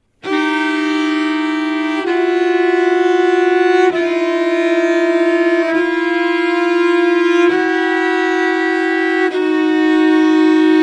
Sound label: Air close up; Silbury